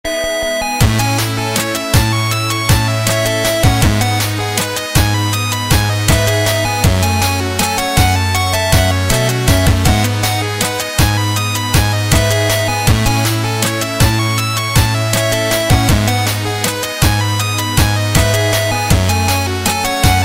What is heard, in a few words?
8 bit